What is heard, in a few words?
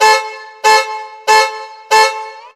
car alarm; SoundsCrate